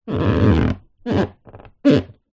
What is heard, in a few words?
sport surfboard body hand rub squeak